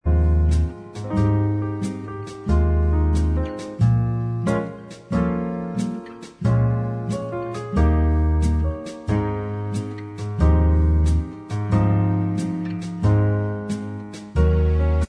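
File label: instrumental Track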